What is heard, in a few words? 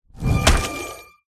Yeti; Pet